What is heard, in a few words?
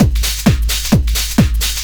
techno, DRUMLOOPS